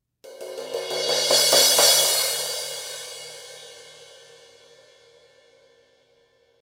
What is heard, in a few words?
S Thin Crash Build Crash, cymbal